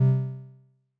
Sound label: Dismiss Noise Quiet; FastTextPager